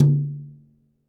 TD-20 Tom A, Roland TD-20 Toms

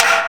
MANIC TEK PRC; TEK PERC1; Drums In Your FACE!

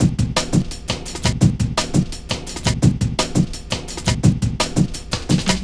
Break, Drum & Bass, Beats & Breaks; Sonic Foundry (Sony Creative Software) - Fluid Dynamics Drum & Bass

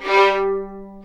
G3, VLNS ARCO; East West; The Ultimate String Collection

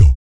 VOC PERC, Vocal Planet Jazz FX BASS PERC

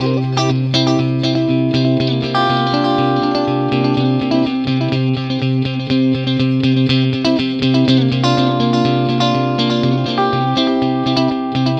guitar, stringed instruments

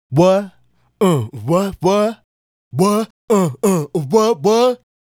Male Solo, Hip-Hop and RnB Vocals

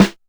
Snare, TR626

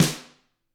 GRETSCH SNR, Double Platinum Drums

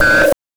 BANK2 SFX, NES DPCM; MIDINES

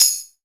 TAMB, TAMBOURINE

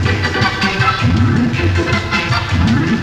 Synth Melody; Eclectic Electronica

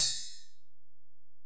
Ac Perc, Drum Machines Alesis DM-PRO

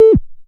vermona drm1 mk3 fx

